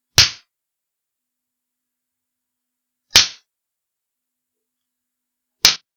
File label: Whip